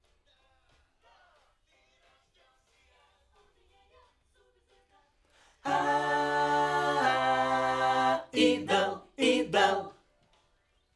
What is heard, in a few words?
Backs AKG